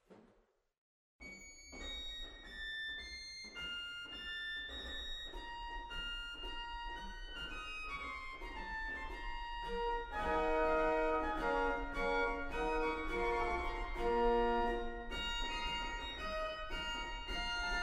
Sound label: Celinder Qvinta3; waltershausen